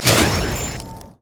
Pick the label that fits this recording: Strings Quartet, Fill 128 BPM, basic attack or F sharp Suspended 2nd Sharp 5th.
basic attack